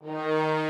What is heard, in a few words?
strings